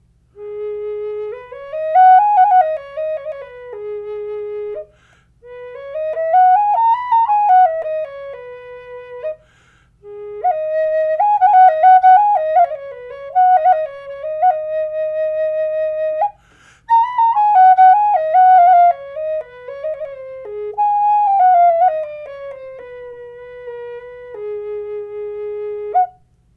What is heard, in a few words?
Gsharp 4 Chechen, flutes